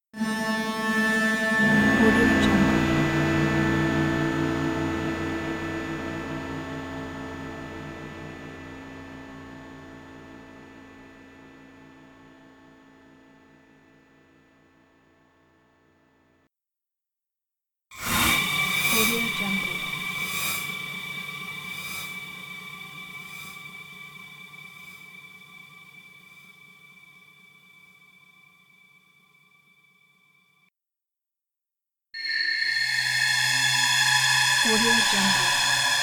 strange film ambiance

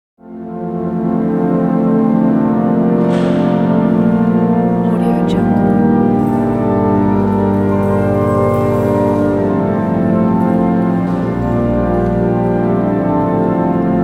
cathedral organ during communion; hollywoodedge

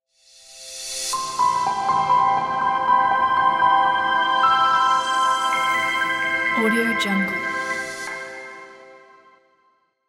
atmospheric piano logo

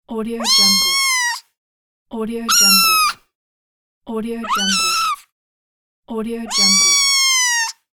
kitty pack

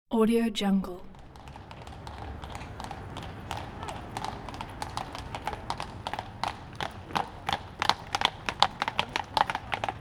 horse cab close passing

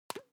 object hygiene floss twitch

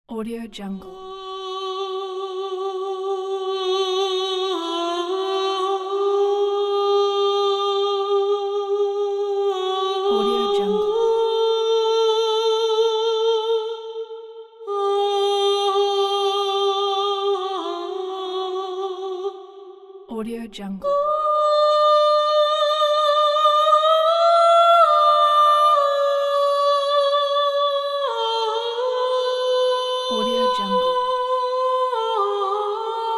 uplifting opera soprano vocal

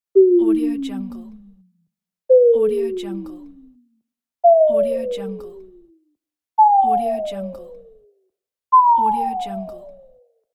cartoon falling pack

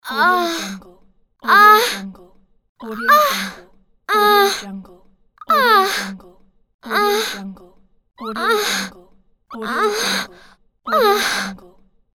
female moans

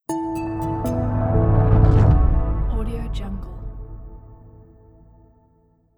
short soft gentle animation logo

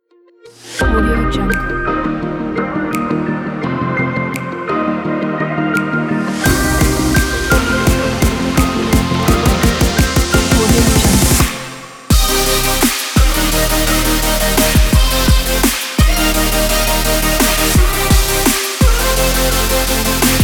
inspiring future bass

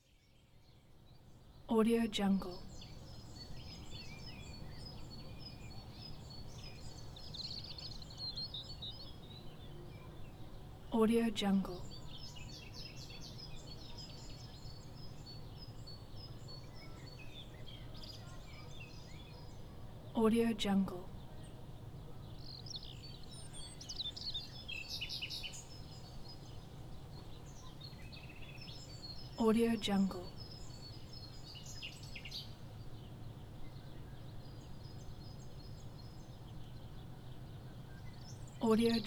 morning bird singing in the city

